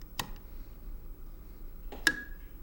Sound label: Light Switch On Off